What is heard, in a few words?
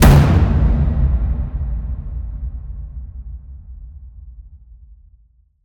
HIT Slam, Cinematic Hits Designed